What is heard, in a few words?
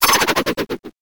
weapon shuriken throw